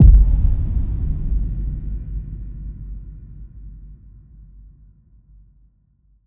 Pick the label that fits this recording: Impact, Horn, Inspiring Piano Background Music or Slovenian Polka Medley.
Impact